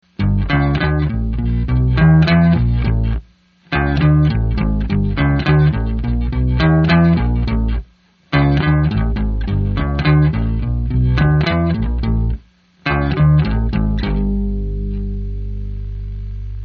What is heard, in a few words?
pitch shift